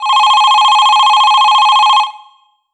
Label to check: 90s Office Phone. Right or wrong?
Right